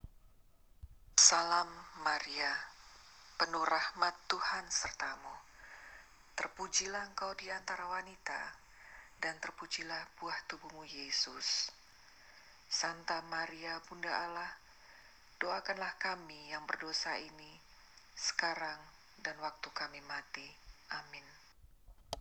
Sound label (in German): Ave Maria Indonesisch